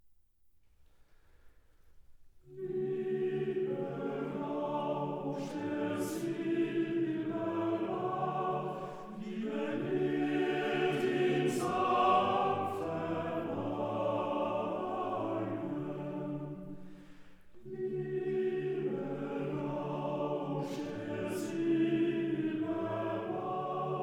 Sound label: Chorus; Liebe D. 983a